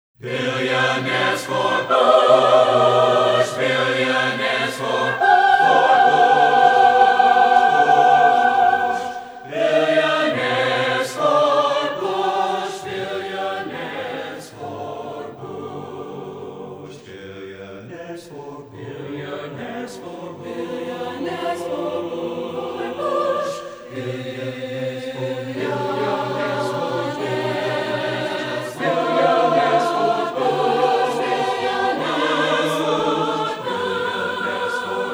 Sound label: Chorale